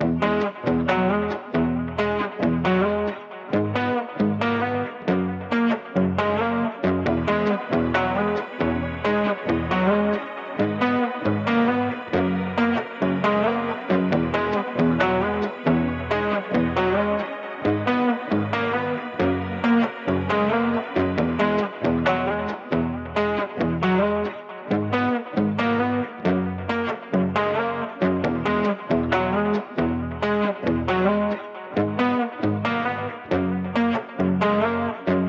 Journey Guitar Loops, 136 BPM G# Min; Grapevine; Cymatics

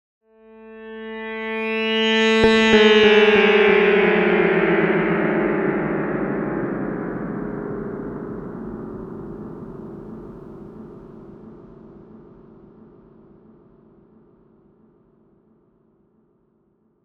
Trippy Sitar A 140 BPM; Digi Box

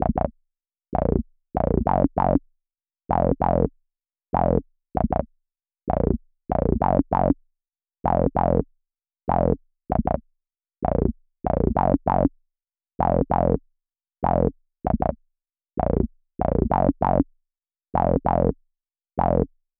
West Coast Melody Loop - 97 BPM A# Min Bass; Melodies; Loop Stems; Cymatics; Oracle Sample Pack

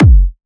Techno, Kick; Techno Sounds 2024

